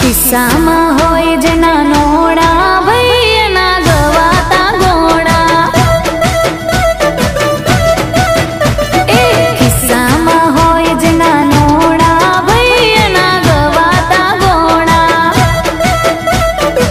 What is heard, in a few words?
Gujarati Song Ringtone